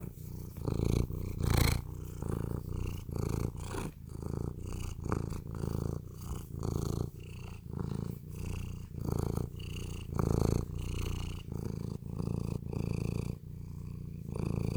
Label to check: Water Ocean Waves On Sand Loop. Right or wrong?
Wrong